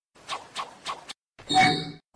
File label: jutsu activation